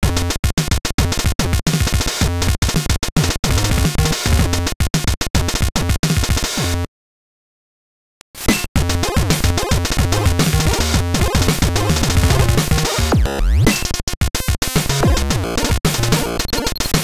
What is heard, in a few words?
sndtest sameboy